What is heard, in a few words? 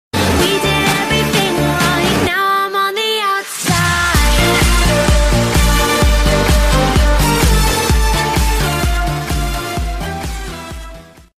donation music for twitch